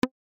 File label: Move Cursor Sound; Bloodungeon